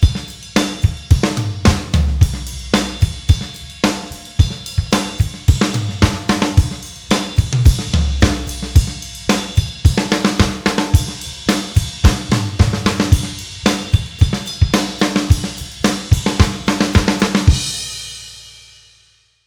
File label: Drums 110bpm 16th; Acid